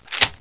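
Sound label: double barrel draw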